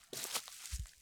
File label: Rustly Step, Footsteps, outdoor